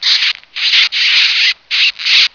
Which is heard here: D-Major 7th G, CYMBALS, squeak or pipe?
squeak